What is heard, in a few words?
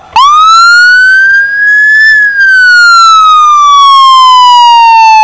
Whelen Wail